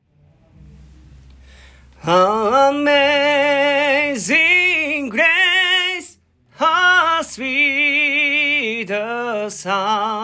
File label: iPhone Music